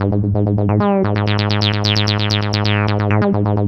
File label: G#, synth line; 90 s Sample CDs; NiceBeats; SynthLine VOL-3